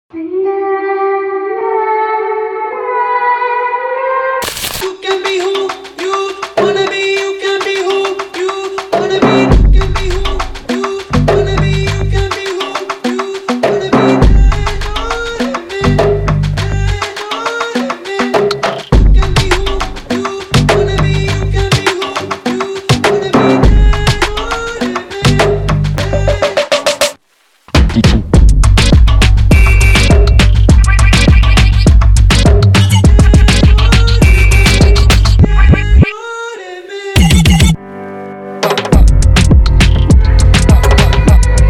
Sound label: INST 102BPM Gm